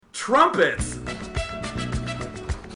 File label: trumpets; day 9